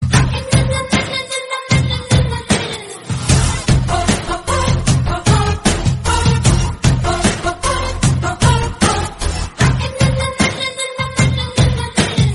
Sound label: kannada tone ringtone